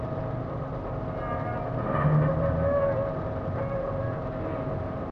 ambient port; World Of Warships; port3d

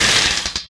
rotor M61 end out

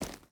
footstep hide gravel run; Rust

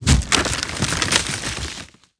pole laying; World of Tanks Blitz

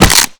land damage; footsteps

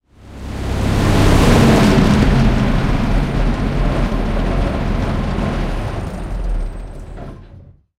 panther engine int stop, engines tanks; War Thunder

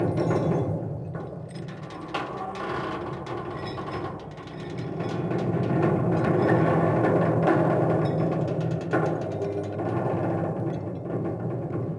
metal stress; ambient; World of Tanks Blitz; halloween 2018

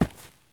landing grass low; Escape from Tarkov

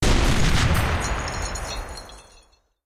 20 23mm tail close, guns tanks

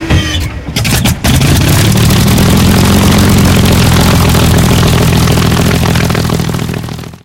engine start; War Thunder

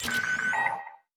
chat incoming message w insurance; Escape from Tarkov

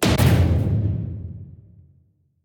damage hits explosions, Warships

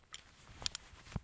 splint start; Escape from Tarkov

